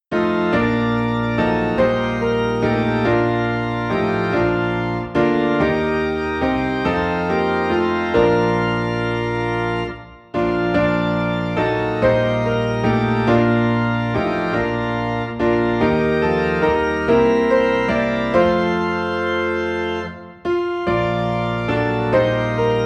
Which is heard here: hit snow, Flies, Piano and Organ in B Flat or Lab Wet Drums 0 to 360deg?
Piano and Organ in B Flat